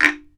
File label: BAMBOO, World Assortment